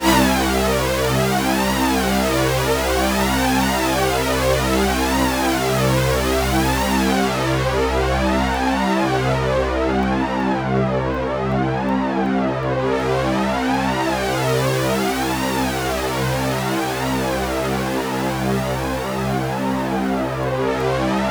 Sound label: C2, Synth Line; 90 s Sample CDs; NiceBeats; SynthLine VOL-20